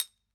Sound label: Particle, glass